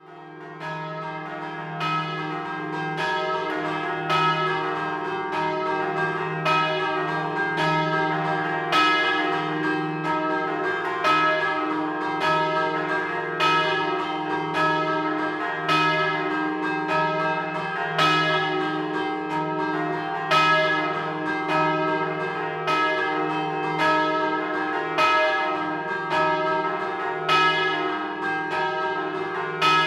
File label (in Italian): Turrida Scampanottata